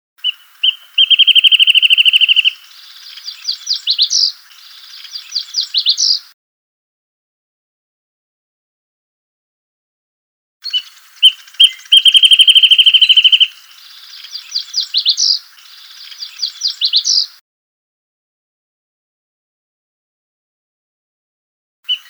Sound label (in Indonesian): suara call song burung wrentit